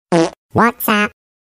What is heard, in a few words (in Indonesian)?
nada notifikasi kentut; whatsapp